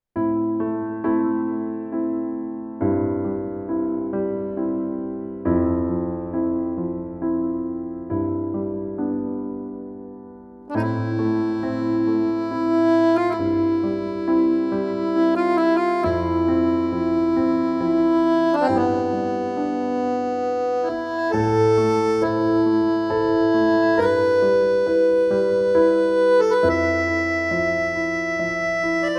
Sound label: Akordeon Verslyon; Bi Küçük Eylül Meselesi